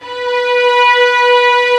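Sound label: Vio legato B3; Digital Sound Factory Virtuoso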